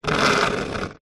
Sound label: plastic item scrape concrete; zapsplat; foley